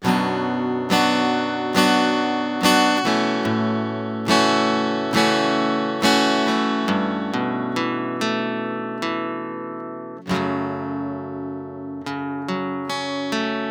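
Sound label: Guitar 140 BPM B minor; Guitar Loops; Ghosthack; Ghosthack Advent Calendar 2021; Fading Away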